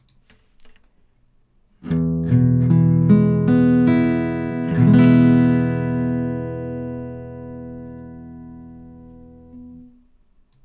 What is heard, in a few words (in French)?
accord de MI, guitare